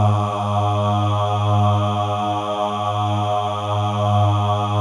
MALE AHS, Symphony of Voices; Spectrasonics

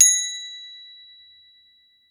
CROTALE B5, Crotales

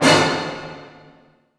A DIM, ORCH HITS; Sound On Sound; Peter Siedleczek's Orchestra

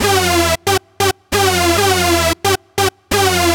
Hoover; Sonic Foundry (Sony Creative Software); Afterhours EDM; Session 10 - Come In Hard

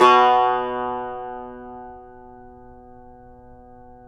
CUMBUS B, Guitars

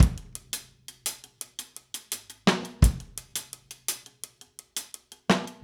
Drums, 70s Analog Funk; Sonic Foundry (Sony Creative Software); What it Is! 70s Analog Funk

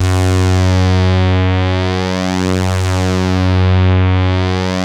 PULZE SWEEP, BASSES; Masterbits; Vintage Voltage

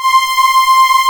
MIX STR C5; MIX STRINGS; AKAI S6000 CD-ROM - Volume 1

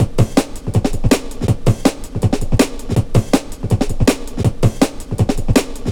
162, breaks, Loops; M8

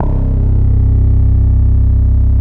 bass, Drum & Bass, 145 BPM; Ueberschall; Drum & Bass Resonance

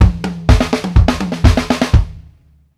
STRGHT KI, drumworks fills; Sampleheads; New York City Drumworks VOL-1; KD FILLS 120

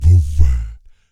VOC PERC, Vocal Planet Jazz FX BASS PERC

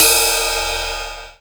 Ride Hard, linndrum; classic machines

